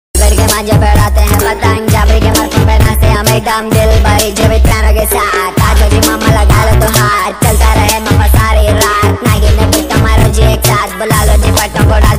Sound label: Hyderabadi Rap Song Ringtone